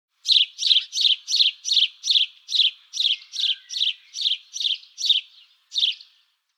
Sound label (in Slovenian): Domaci vrabec